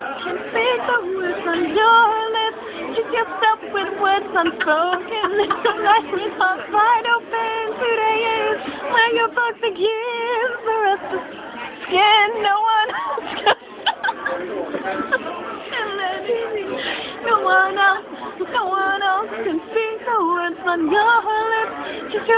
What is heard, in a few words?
SINGING